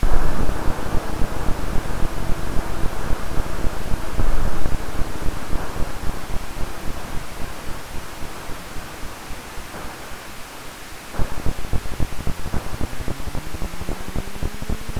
Waterfall Loop; high strangeness; wii u

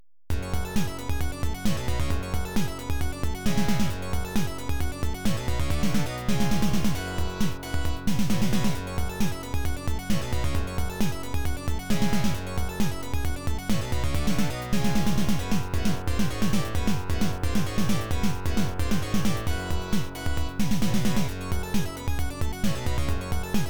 Jackpot Timer

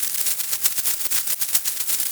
Firefly Buzz Low; the princess and the frog; Bayou

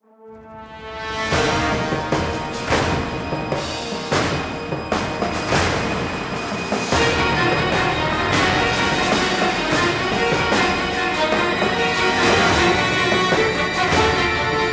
Sicily Action

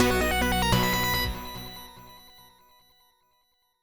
Jingle artefact

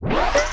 spinup; OK K.O. Lets Play Heroes; mus postfight pinatabash

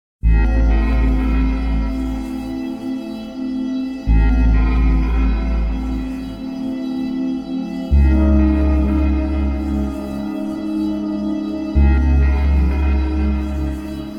Chord Medium; beyondium; android ios

